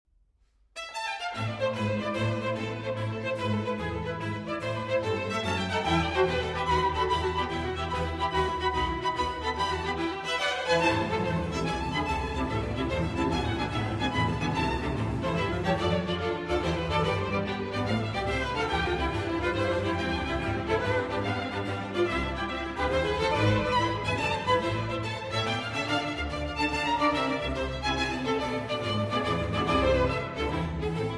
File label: Violin Concerto in A Minor; No. 1; BWV 1041; J.S. Bach